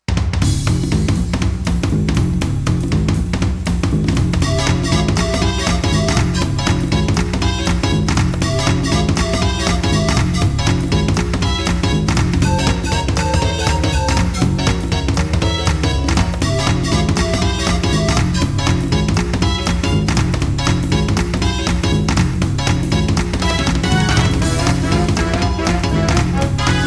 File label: Jungle; MSB; Donkey Kong